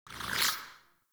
Tenno Weapon Show; Warframe; Weapon Visibility

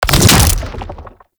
Head Spin; Warframe; ManInTheWall; Octopede

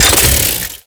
Ink Rifle Mech, Weapons; Warframe; Tenno

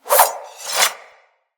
Unequip Dual Daggers; Weapons

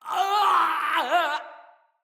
Death, Soldier Male; Dendrit

